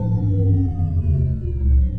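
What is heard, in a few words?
ship transfer power stop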